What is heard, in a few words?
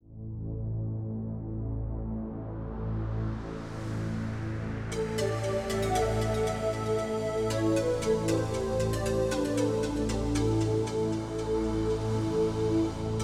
Standard Drums